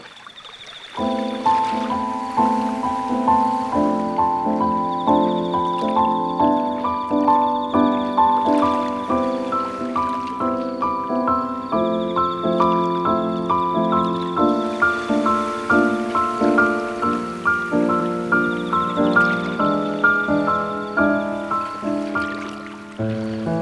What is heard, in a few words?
Ocean Music Box